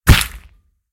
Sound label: Punch Bone Cruncher; SyrinScape; City gone mad